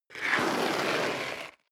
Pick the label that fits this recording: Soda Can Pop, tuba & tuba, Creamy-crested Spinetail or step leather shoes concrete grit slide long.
step leather shoes concrete grit slide long